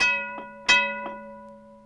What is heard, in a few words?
musical